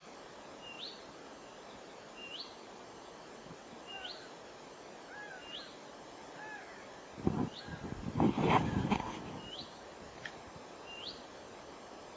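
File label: wail, honey buzzard; hexhamshire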